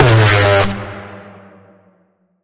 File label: Bend The Alarm, G sharp